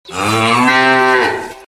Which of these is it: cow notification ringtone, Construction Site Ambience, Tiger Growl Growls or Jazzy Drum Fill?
cow notification ringtone